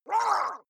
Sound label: water monster female gargle pain short; comedy-cartoon; soundpool